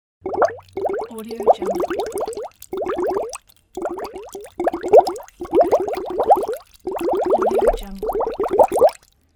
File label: bubble